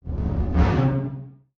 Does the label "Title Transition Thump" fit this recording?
Yes